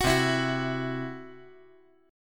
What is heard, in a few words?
C-Major Flat 5th